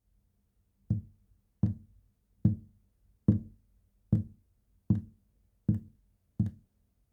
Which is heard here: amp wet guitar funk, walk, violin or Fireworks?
walk